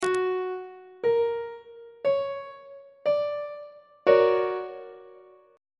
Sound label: e double flat augmented major 7th 1st inversion; on treble clef